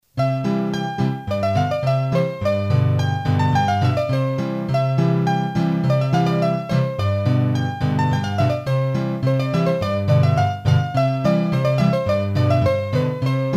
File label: Polka Piquee, hurdy-gurdy; Taitou